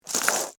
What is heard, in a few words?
Scrape Pasta Shells; Texture, Short; Scrapes (Sound Effects:Objects); BlastWave